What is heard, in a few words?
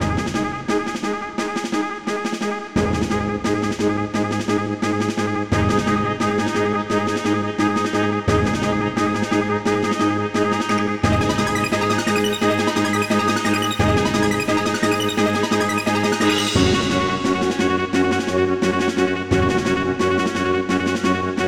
space orchestra